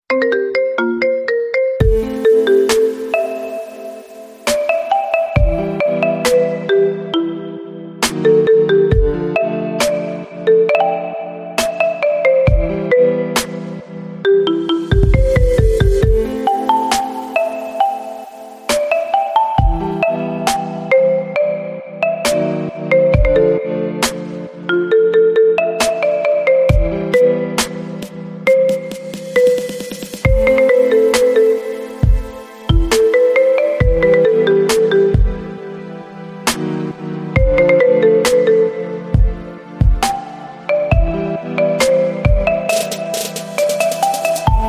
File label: Marimba Remix